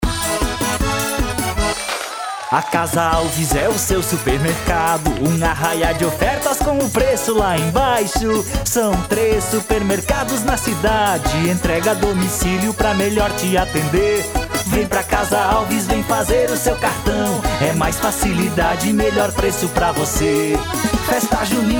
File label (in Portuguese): Junino, jingles comerciais; Casa Alves Supermercado